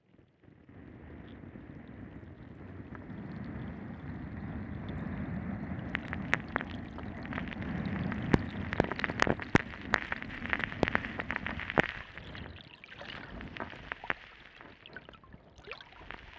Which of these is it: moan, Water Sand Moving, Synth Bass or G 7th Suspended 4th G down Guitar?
Water Sand Moving